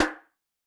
JNGL SN, Snare; Yamaha DTXpress-II

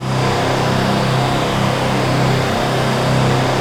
AMB THIK W; Synclavier Sampler Library; KEYS AND GUITARS

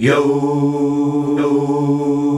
YOOOOH D, male choir; Best Service; Hyper Dance

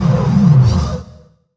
DANCE FX

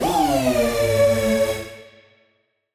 Siren Horn down, Ramp Down, A; SirHornA; future rave

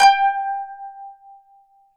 KANUN G5; SoundBytes; Early Patches; KAN CHOR BIG

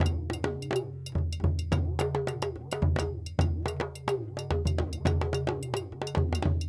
Djoun, World Percussion

